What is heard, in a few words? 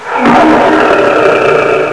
lion